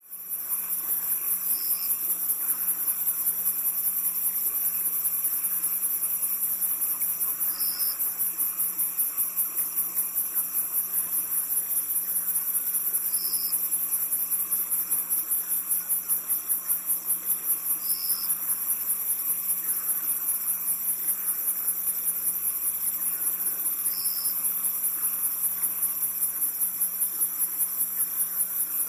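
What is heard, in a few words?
Crickets Night Ambience Frogs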